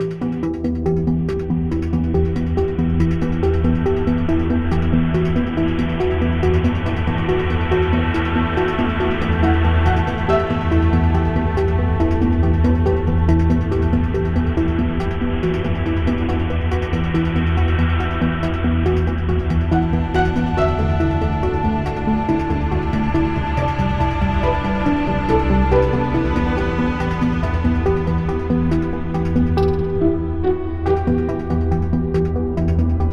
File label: Ambient Recollect; NWNDS